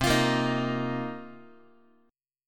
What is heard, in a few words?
C Minor 11th C Guitar; strummed; JGuitar